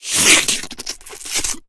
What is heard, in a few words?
smoker launch tongue; voice; source engine; left 4 dead 2